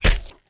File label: sword cut flesh